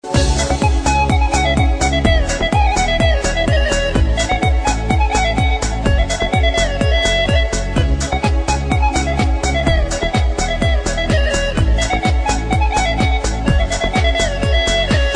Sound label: russian folk